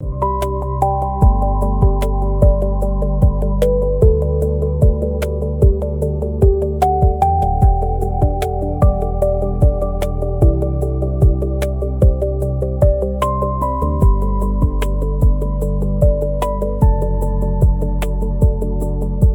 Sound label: loop; bgm